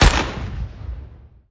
Rocket Explode; Angry Birds Seasons

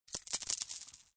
SE Coin; Shop Empire

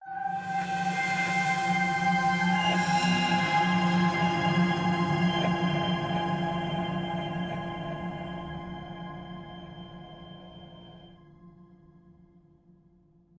Flourish; New Storytime

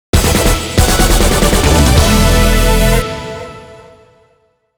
Stage Clear Jingle

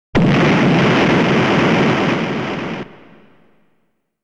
Explosion (Large); genshitou prehistoric isle

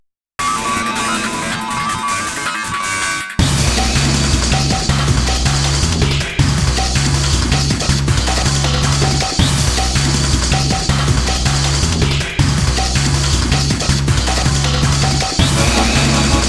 NOISY NOISE; The World Ends with You

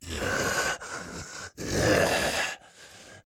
Walk Cycle Burns; The Town

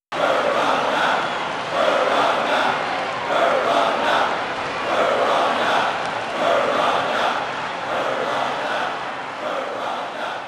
Crowd Chant; mario strikers charged football wii gamerip 2007; Petey Piranha

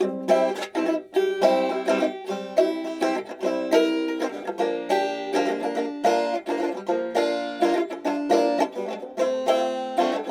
String; Borderlands 2

